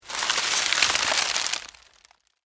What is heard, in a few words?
Foliage Crunch, Movement, Palm, Frond; Texture; Rustling; BlastWave